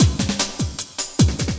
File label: LOOP, Drum & Bass, 150 BPM